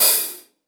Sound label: Open Hihat, Cymbals; Vengeance Samples